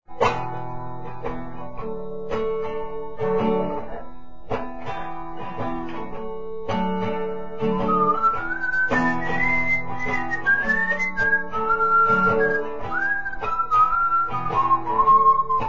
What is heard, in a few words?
the whistle song